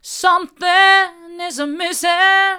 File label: something is missing hard, vocal